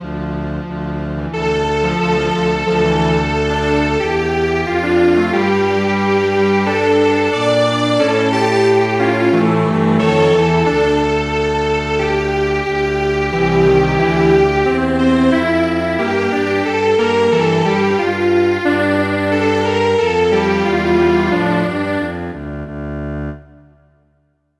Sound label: Strings Quartet, midi traditional